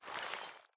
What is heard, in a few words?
reels fall out; hades River Of Souls Desktop; Fantasma